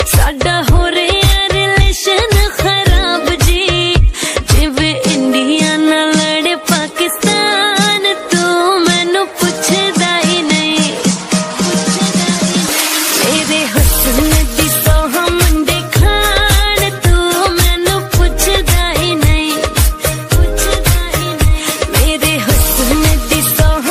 punjabi ringtone, bollywood ringtones

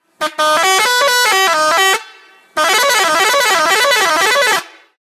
pakistan bus horn ringtone; sms ringtones

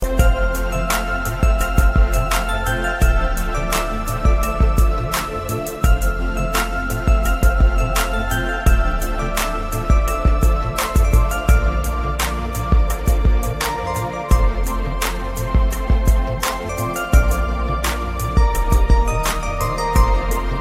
soft valentine ringtone

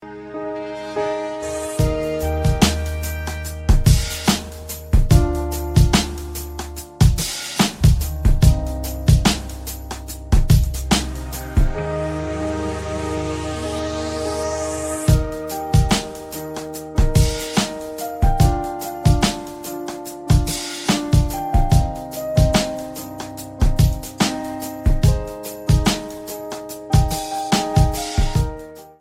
beat romantico ringtone

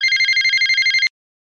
call beep

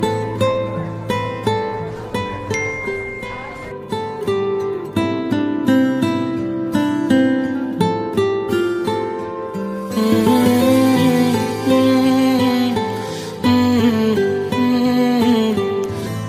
bollywood music ringtone

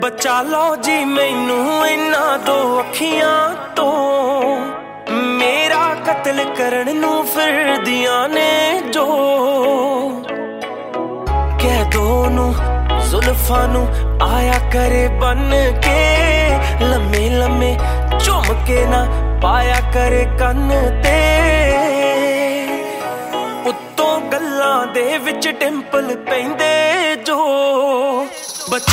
punjabi ringtone

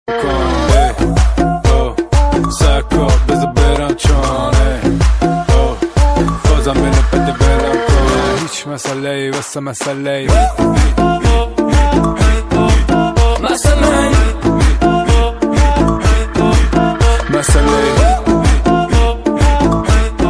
hindi ringtone, bollywood ringtones

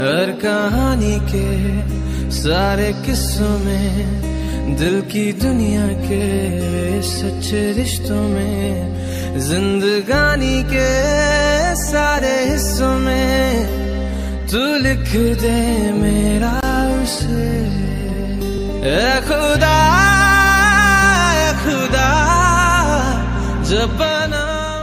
punjabi ringtone

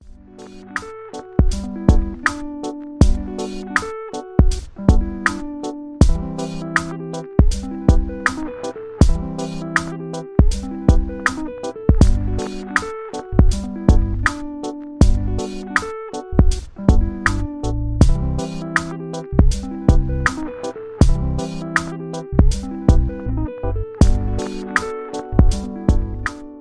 lofi ringtone; iphone ringtones

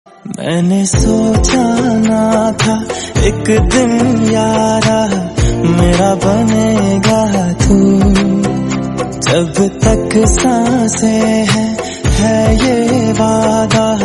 romantic song tone ringtone, bollywood ringtones

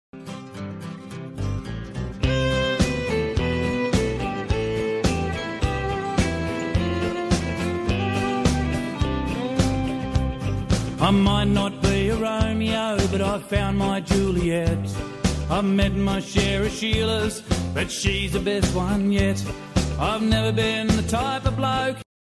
australian ringtone

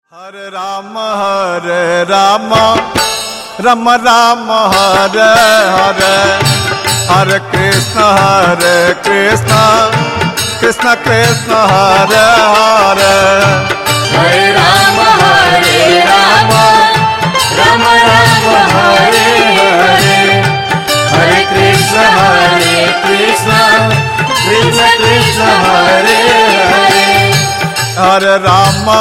dial tone ringtone

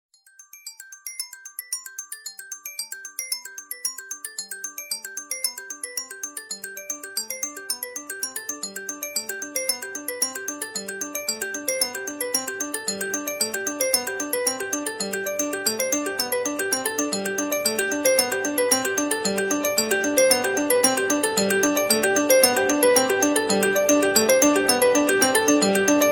ringtone, nokia

